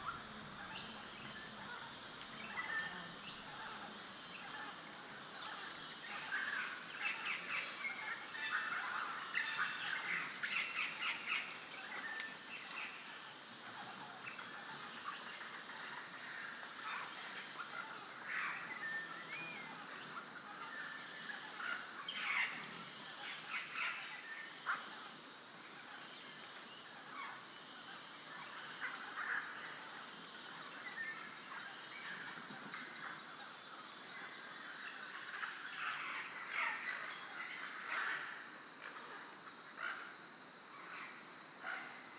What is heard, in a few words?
background sfx; netent